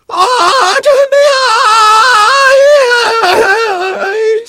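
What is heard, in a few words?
engineer pain critical death; voice packs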